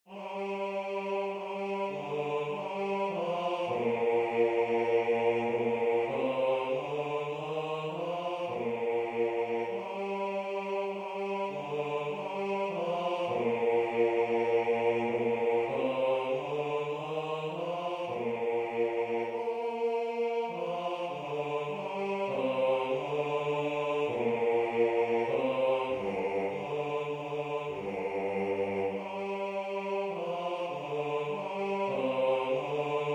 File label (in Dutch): Bas; Een lied tot Jezus Christus